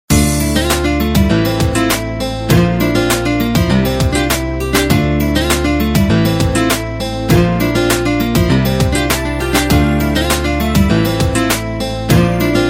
instrumental song ringtone

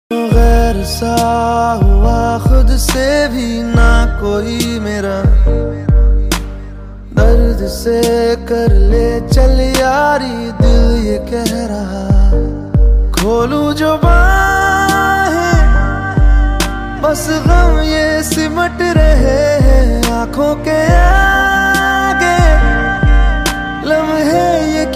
bollywood ringtone

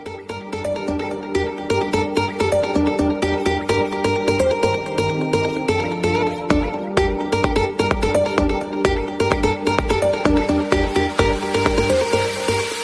iphone x arabic marimba ringtone

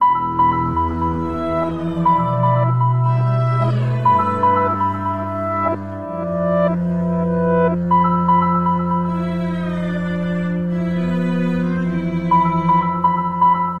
nokia mobiado ringtone

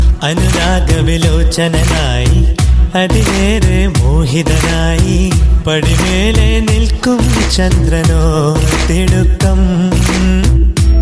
malayalam new tone

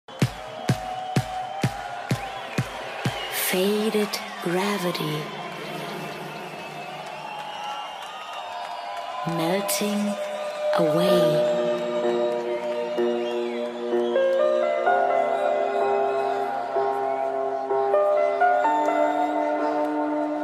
trance ringtone; tomorrowland belgium

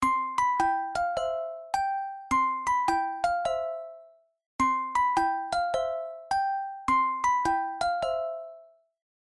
bright morning samsung s7 ringtone

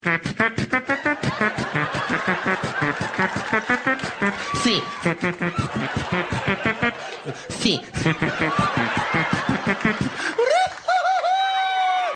mexico ish ringtone

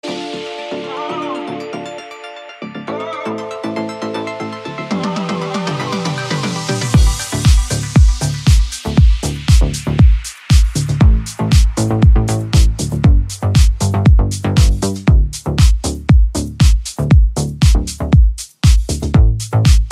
samsung galaxy j7 core ringtone

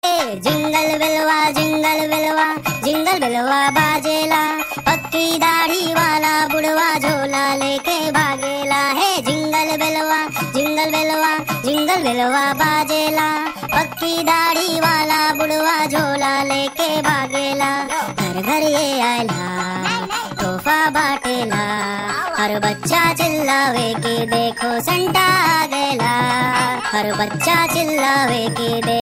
funny jingel bell ringtone

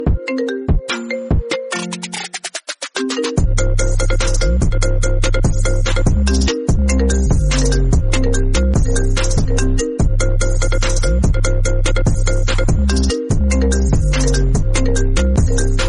electro ringtone; iphone

